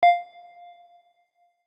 Unlock; LG; Qua tab PZ LGT32

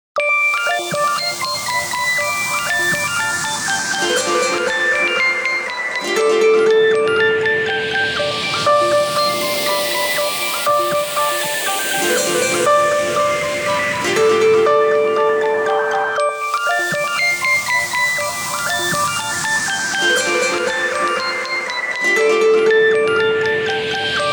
Waves, ringtones; Sony Ericsson W705

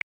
Effect Tick, ui; LG Optimus G Pro F240K Lollipop

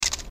shot sound, camera; Huawei C5110

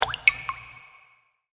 call mail; HTC; Infobar-A02

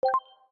Unlock tension; Samsung Galaxy Tab E 7.0 SM-T116BU

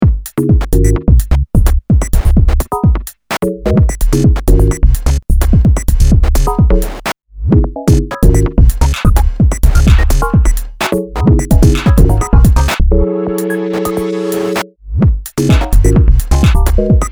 Bounce Ball, phonetones; LG

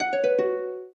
Close, phonetones, LG A250 system

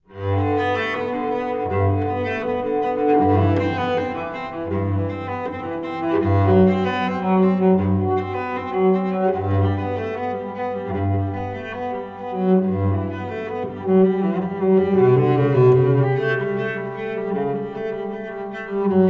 Bach Cello Suite Prelude, alarms; LG X power K220

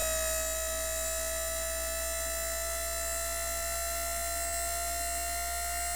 zoom in; Samsung SGH-i907 Epix

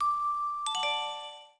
Radio Off

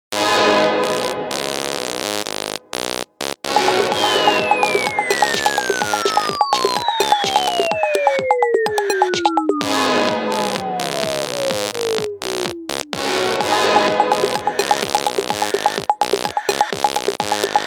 Brighton beat, ringtones; Sony Ericsson W705

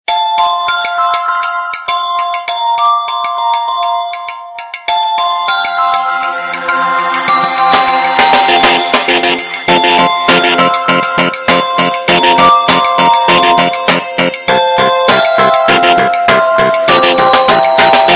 Music box, ringtones; Coolpad 5860

